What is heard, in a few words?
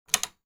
switch